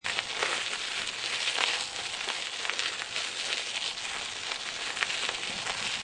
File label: Bicycling On Gravel Road Loop, Bicycle: Cycle on gravel surface